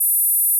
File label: Gauss Filt A5